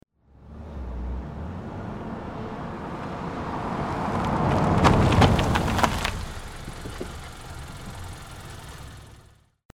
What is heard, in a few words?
car in street